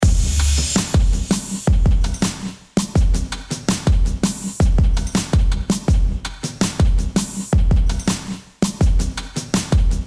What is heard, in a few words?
Beat Wet